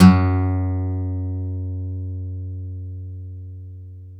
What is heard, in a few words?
STEEL F F#1, Synclavier, GUITARS; Synclavier Sampler Library